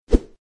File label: hatchet swing